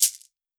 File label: shek, WORLD PERCUSSION